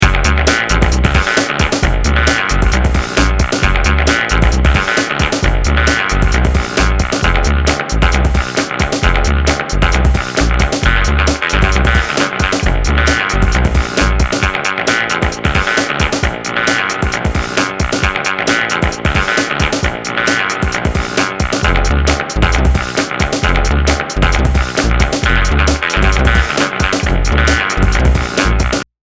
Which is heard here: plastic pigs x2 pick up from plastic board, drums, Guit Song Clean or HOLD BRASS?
Guit Song Clean